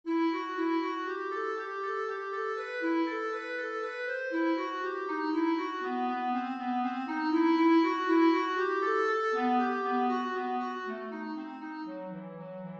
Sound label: scale study in a minor key; lewis alastair